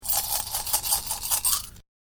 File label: Money Shaking Some Coins; Money Sound Effects; Andrew Fleming